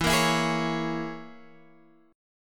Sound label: E 6th Flat 5th E down Guitar Standard; E6b5 strummed; JGuitar